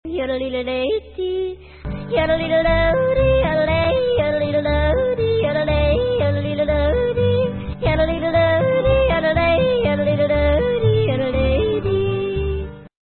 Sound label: yodel